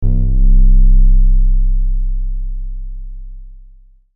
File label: Sonic Pressure, Scifi, Sonic, Pressure, Release, Low, Verb, Stab, Science, Fiction; BlastWave